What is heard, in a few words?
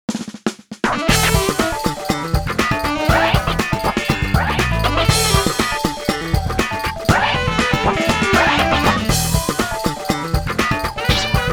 Funky Scratch